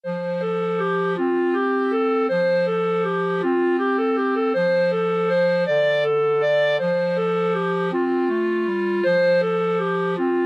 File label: morning, clarinet duet